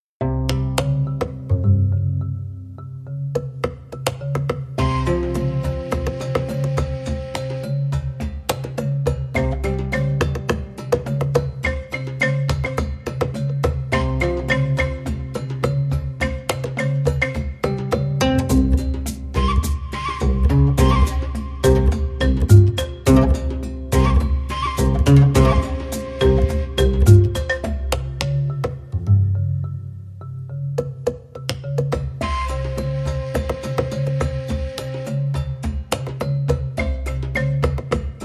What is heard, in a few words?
African Trepidation